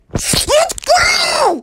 girl screamed lets go

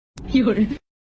cuuttee funny meme sound

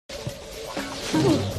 tumbleweed hits; pablo

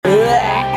bleeeh

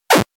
classic death; spelunky classic

